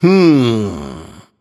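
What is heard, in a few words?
thinking, nonverbal; smite; voicepack; khepri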